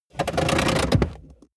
car bmw e46 interior seat push back completely rattle